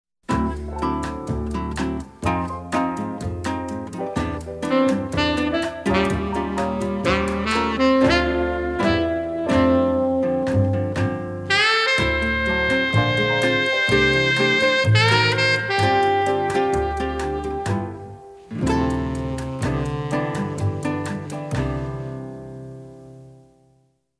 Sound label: Soft Guitar